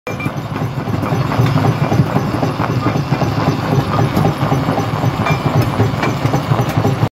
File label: clean machine